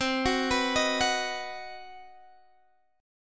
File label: C Minor Major 7th Flat 5th chord, Guitar Open D